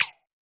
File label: PERC